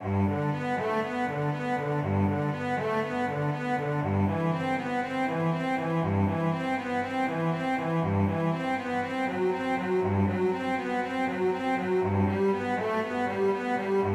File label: prelude flute; bach